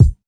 00s kickdrum sound a sharp key, Royality free kick one shot tuned to the A# note